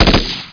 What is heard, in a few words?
m16 burst; SPECOPS